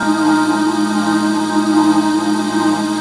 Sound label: Pad